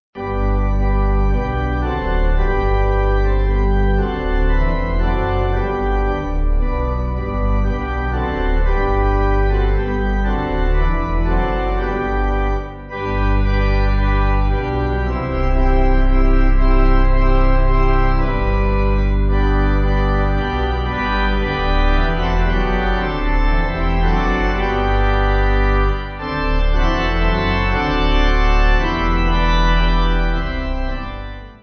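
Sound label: Pipe, Pipe Organ; Fischer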